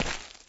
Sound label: Dungeon Medium, shagov